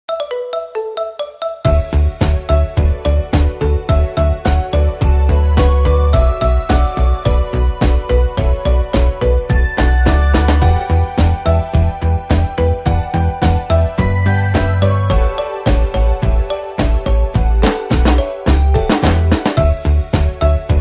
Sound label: iPhone 11 ringtone